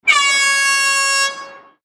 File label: Air Horn